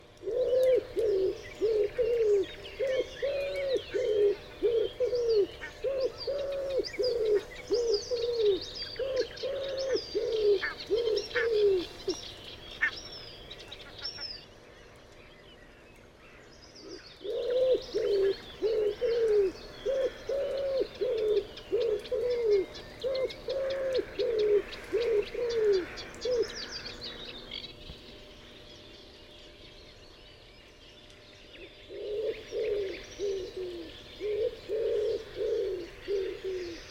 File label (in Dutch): Houtduif Columba palumbus